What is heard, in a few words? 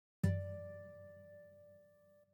Harmonics